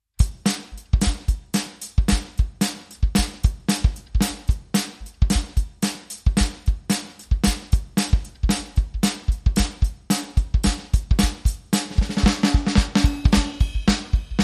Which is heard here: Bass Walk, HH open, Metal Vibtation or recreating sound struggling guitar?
Bass Walk